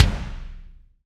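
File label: bass drum, Roland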